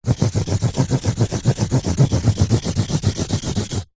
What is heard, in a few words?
office pen marker large scribble scrub out on cardboard